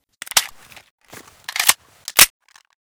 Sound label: beretta reload empty